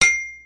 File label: clang